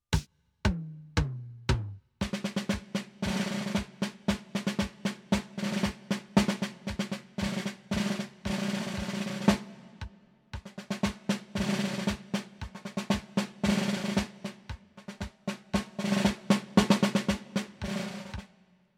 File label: Roll Base Tempo